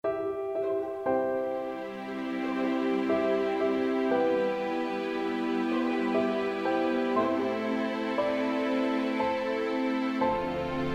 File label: Lullaby